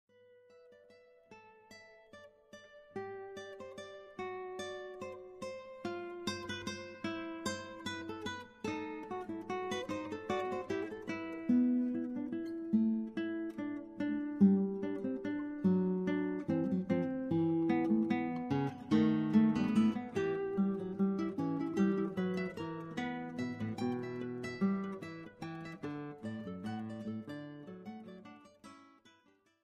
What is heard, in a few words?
Conversations for 2 Guitars